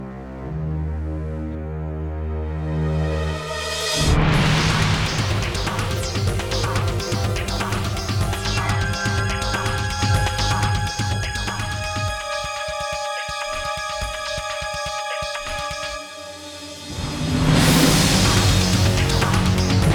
Fight loop; Ben 10 Protector of Earth; Wii

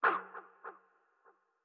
Hurt; StyxMech; Going Under

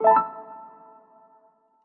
Goal

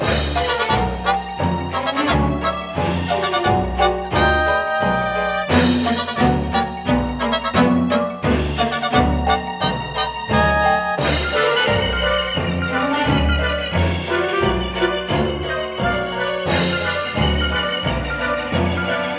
Ruins Fight; Don't Starve Giant Edition; PS Vita

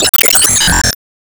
Player Pain Damage

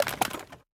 PickUp Furniture

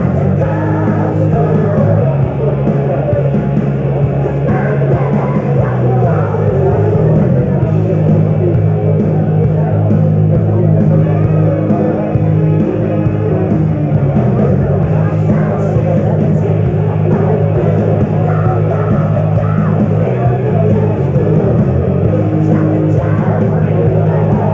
Bass; leisure suit larry magna cum laude

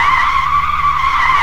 squeal

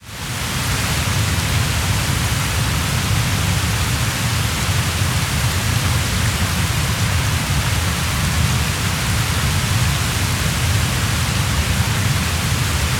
Niagara falls Waterfall

